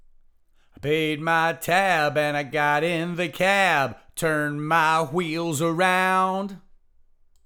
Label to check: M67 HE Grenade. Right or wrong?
Wrong